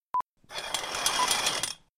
Drawing Curtains, bedroom curtain, metal pole

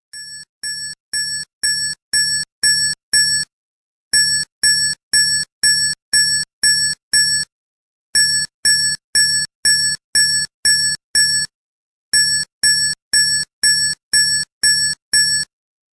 steady 49 phone bell; Ringtone Records

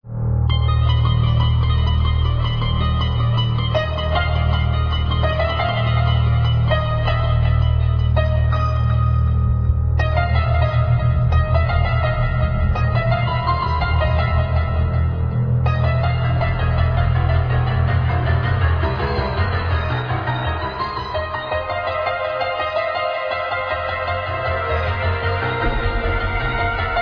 Horror Strings, Scary String Orchestra; Freaky

